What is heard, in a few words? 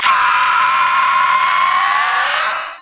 Scream From Hell